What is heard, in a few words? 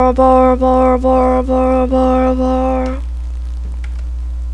bowl